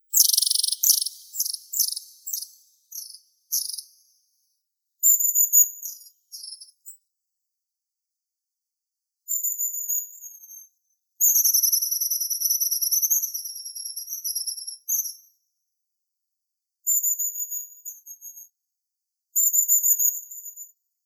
sinitsa dlinnohvostaya opolovnik aegithalos caudatus